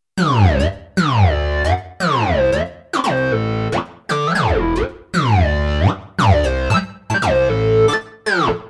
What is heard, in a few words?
synth plastic; Creamware minimax